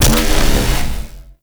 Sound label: Void Dragon Body Ring Impact; Enemies; Abilities